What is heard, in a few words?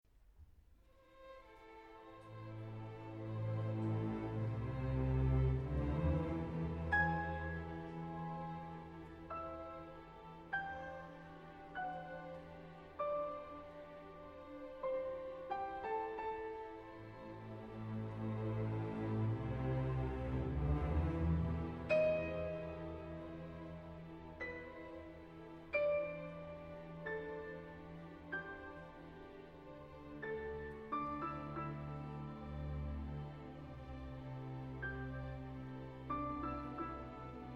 piano